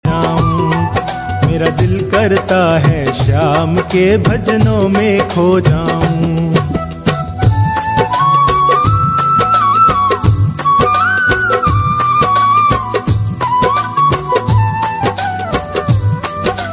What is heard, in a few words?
Khatu Shyam Bhajan